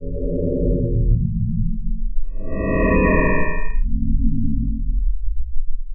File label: lofi metasynth grumblings; Various Strange Sounds and Effects